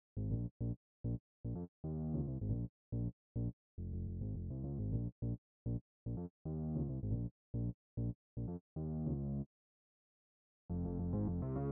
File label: Bass Line